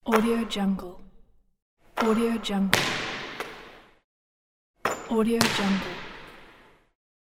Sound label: basketball